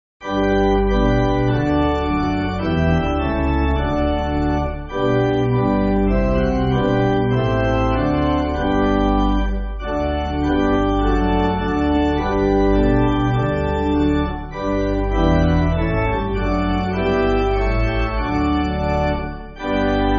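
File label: Pipe Organ, church music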